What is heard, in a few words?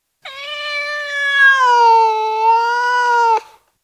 Tom cat, individual meows; Animals; BBC